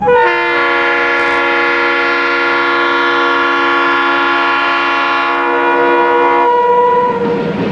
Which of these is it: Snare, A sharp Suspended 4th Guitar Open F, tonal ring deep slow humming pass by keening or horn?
horn